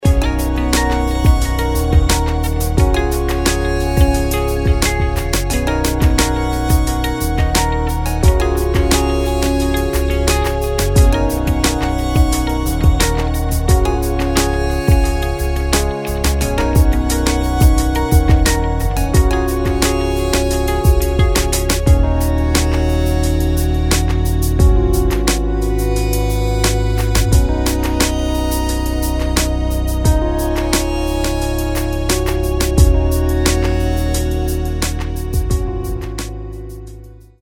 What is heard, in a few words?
Chilled Ambient